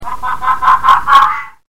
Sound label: fox weird